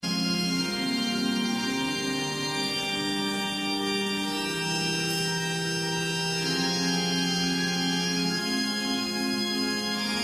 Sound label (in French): musique orgue ouverture improvisation